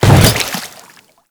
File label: Hammer Boss Step Water, Footsteps